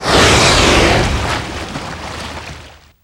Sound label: sprint on; weapons; dota; slardar